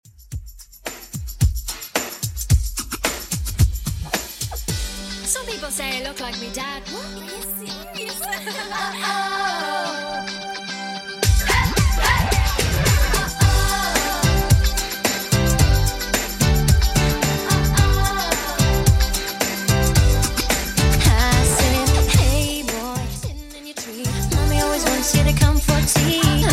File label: 90s Redrum 110bpm